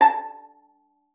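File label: violin pizzicato A5; Xsample VOL-5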